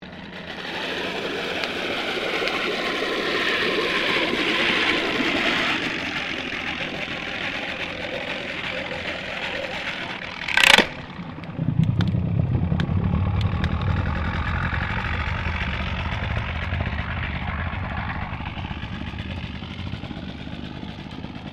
Boat Motor Outboard Mercury40 Start Move Off Submerged; Various private boats; Boats (Sound Effects:Vehicles)